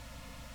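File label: ORGAN Flute noise, Farfisa; Keyboards of The 60's and 70's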